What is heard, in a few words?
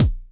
kicks, Bass Drum